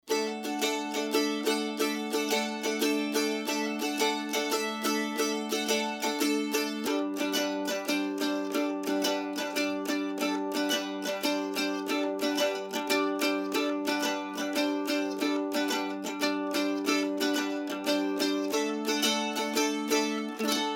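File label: Royer dBooster2 12dB Mandoline Sennheiser MD421; Fender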